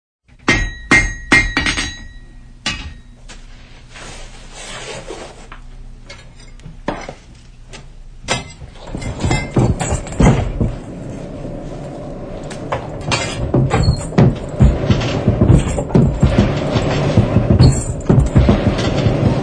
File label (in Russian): kuznicy